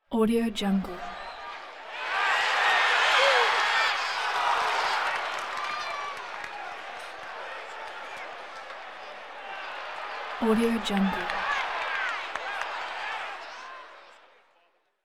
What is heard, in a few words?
stadium negative